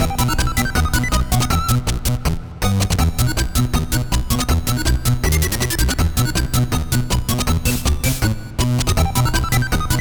music boss elevator

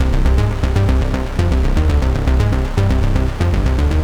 bass; vostok inc. switch gamerip 2017

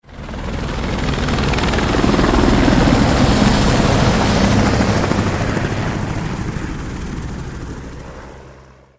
Enemy Helicopter Arrival; Godzilla Smash3

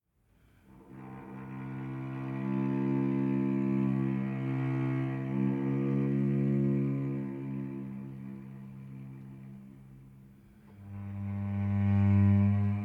Savannah Music

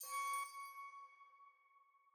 Alchemy Flute Combo

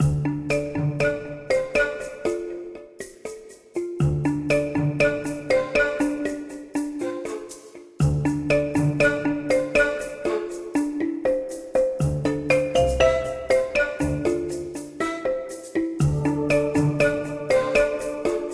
Music Caribbean Calm

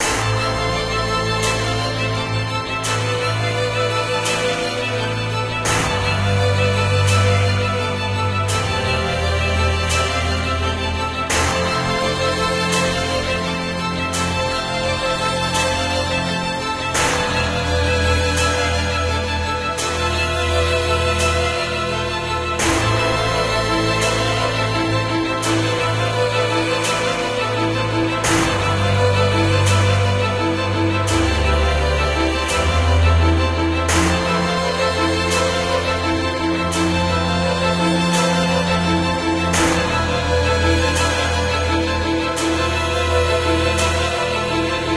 Future World Violins Loop; Chromatic

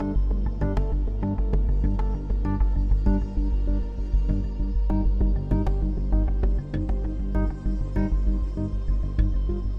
Mood Normal Heavy

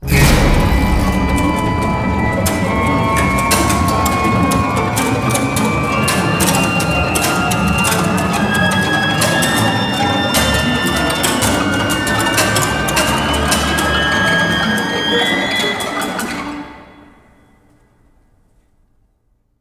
RN Stinger

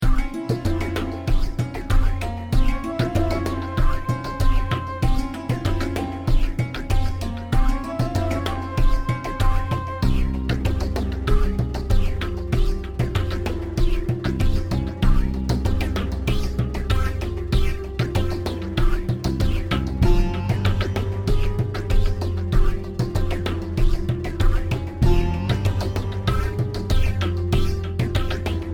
Loop; Laika 2.0

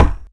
pylon fall

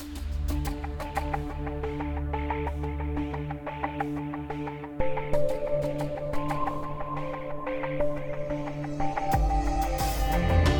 Mood Mysterious Light

